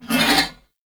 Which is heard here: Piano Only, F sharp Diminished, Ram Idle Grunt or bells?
Ram Idle Grunt